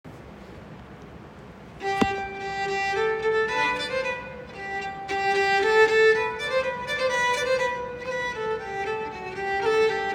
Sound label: French Dance